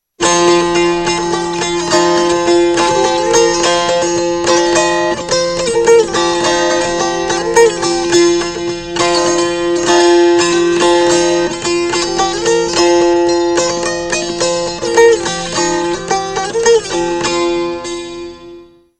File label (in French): cithare des appalaches; instruments